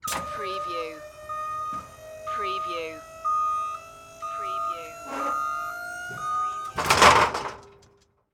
Fire Truck Ladder Down

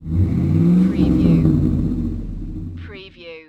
Truck 5.3 Liter Rev Exhaust Perspective; Vehicle / Transport Sounds / Lorry / Truck Sounds

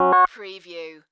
Multimedia Error

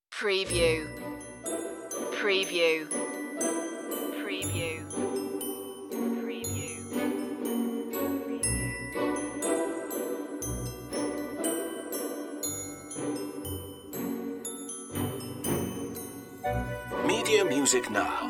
orchestral magical cue

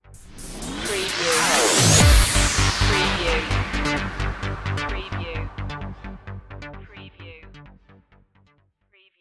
electro symphony stinger

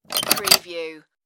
spring lock

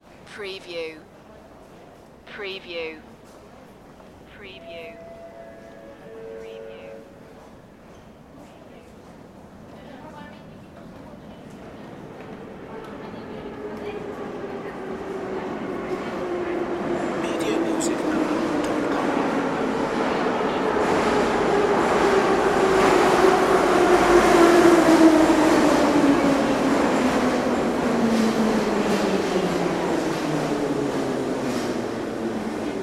subway trains moscow

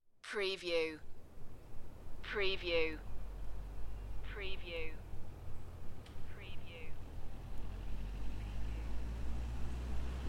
Welsh Forest car park drive by; beanstalk audio

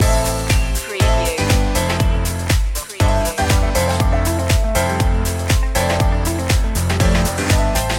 disco chic loop

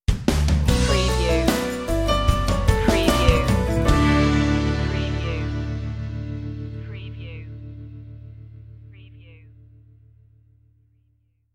dreamy days stinger

